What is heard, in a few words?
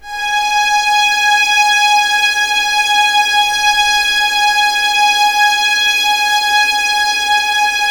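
G#5 legato violins; East West; The Ultimate String Collection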